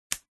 technology computer apple macbook pro power lead magnetic connector plug in; zapsplat